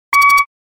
machine beep with light wobble

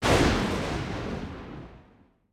Lightning

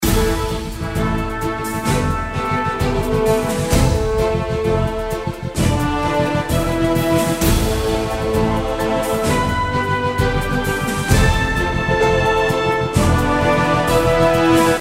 music boss; Strikeforce Kitty

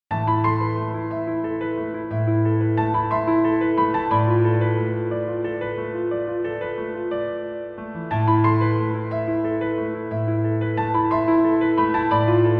Theme Ending Piano Ver

